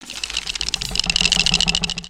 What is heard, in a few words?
Gnarled Torch Shake